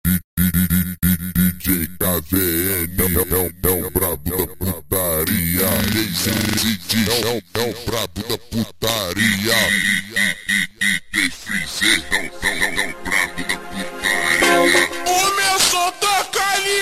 Montagem ultra Slowed

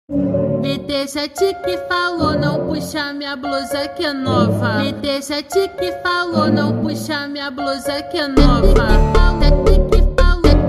Funk slowed

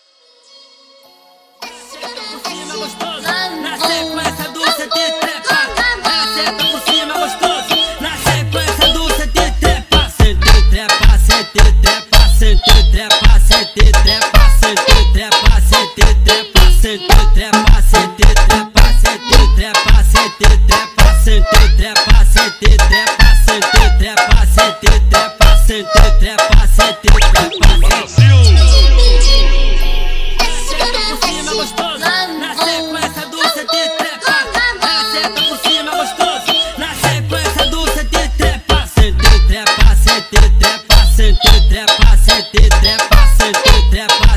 slowed, funk

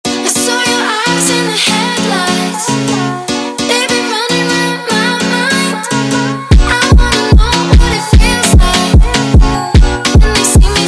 Montagem sped Up

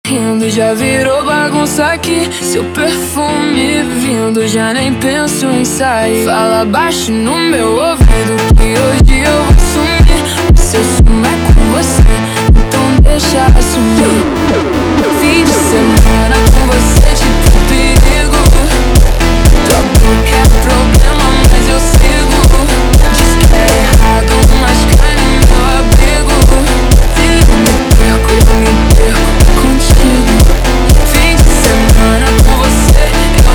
Montagem slowed

